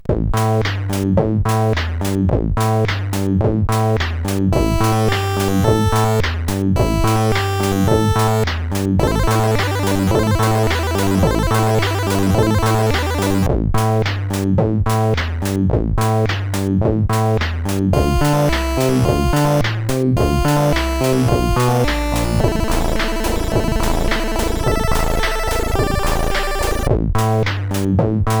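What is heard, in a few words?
MOS 8580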